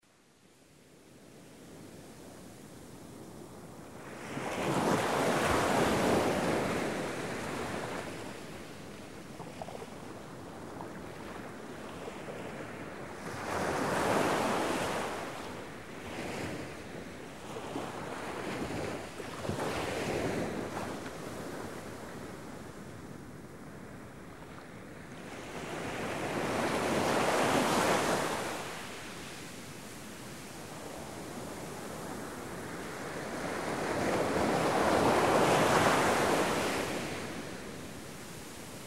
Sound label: ocean wave mild